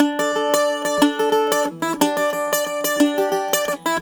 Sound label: Celtic Flavours; USB Soundscan vol.42; AKAI